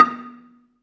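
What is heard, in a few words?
Balafon 2 B2, Asian Traditions; Soundscan vol.60; AKAI; BELAFON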